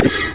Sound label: shot; aggress